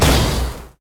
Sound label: hit fire; smite; god merlin